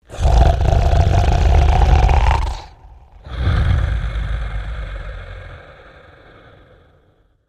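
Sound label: Character Giant Sleeping Snore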